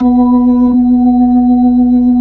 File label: B-3 Jazz B4; Keyboards of The 60's and 70's